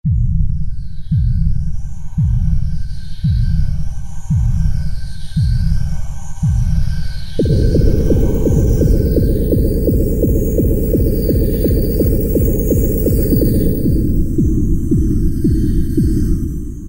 Heartbeat, Korg N364; Factory Prog demos

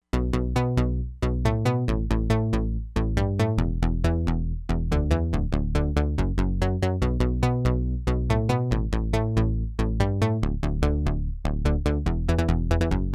SEQUENCER BASS, Crumar Bit 99; Factory Programs

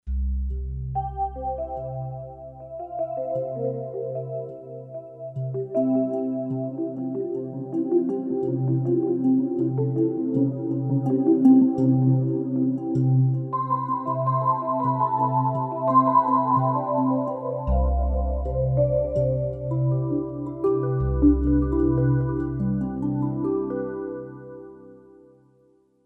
Gamelan Split; Roland SC-880; Factory Patches

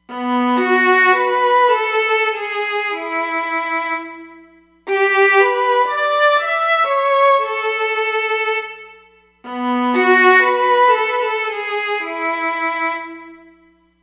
Viola

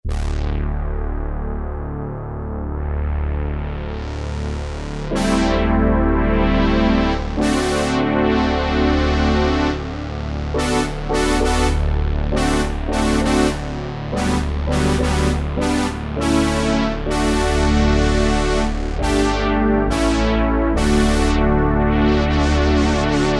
Analog Brazz; Kurzweil K2000 V3

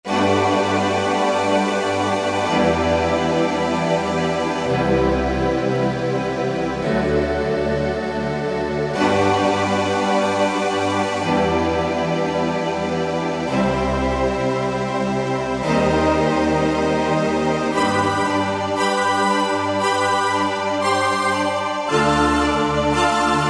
Choir & Strg

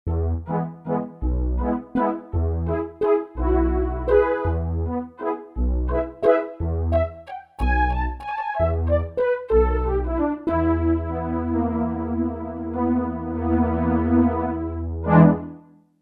Puff, Roland JV-880; Factory Patches

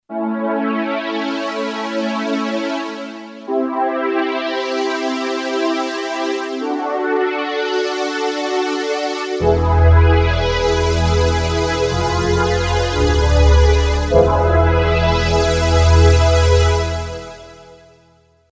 Wave 2001; Yamaha PSR-550; Voice

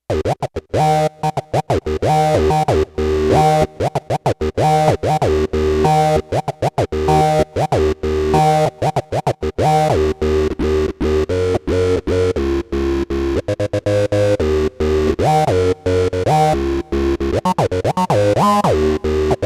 Daft Lead, Roland VC-1

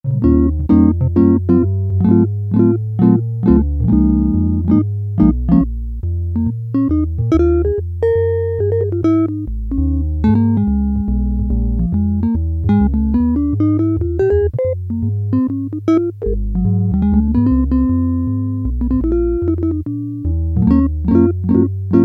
JAZZ GUIT, Yamaha DX7

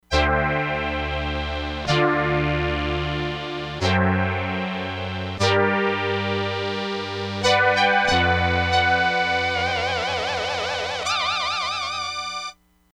ANIMATED, Crumar Bit 99; Factory Programs

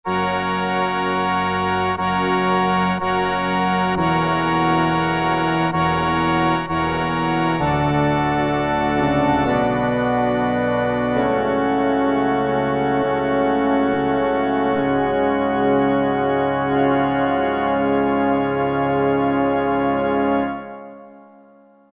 Pipe Org 1, Roland MT-32

